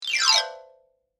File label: Xylophone Gliss; Mallets; BlastWave